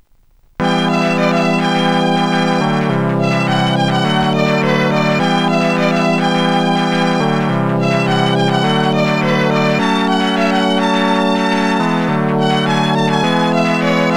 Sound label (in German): chor; Sonix Music Driver